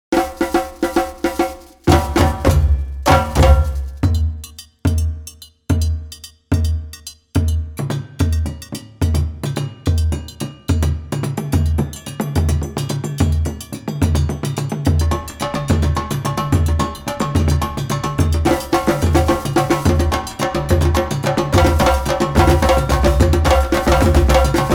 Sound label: traditional percussion ensemble, West Africa; kontakt sound pack